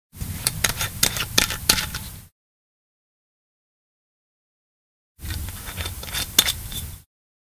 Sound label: Bottle Tops; 100 sound effects